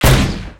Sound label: blast; Forest-warrior